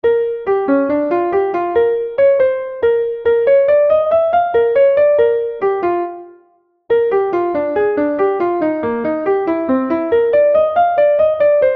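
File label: slow melody only Bb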